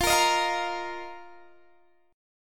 Eb Suspended 2nd, F

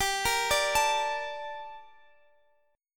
G Minor Add 9th; arpeggiated; madd9:1,b3,(5),9; x,22,20,19,22,x

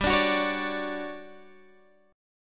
D-Major 7th G; DM7/G strummed

